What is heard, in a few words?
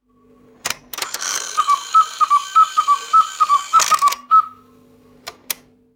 mechanical cuckoo clock striking five times